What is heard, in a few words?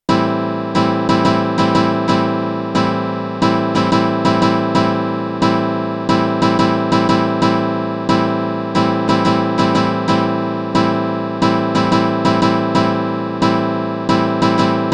Am7 backing